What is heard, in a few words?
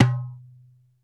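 darbuka D, ethnic percussion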